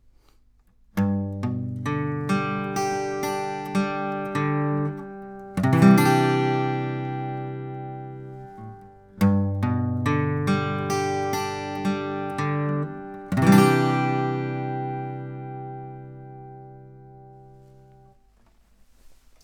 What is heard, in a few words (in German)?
Gitarre; iCON Cocoon 30cm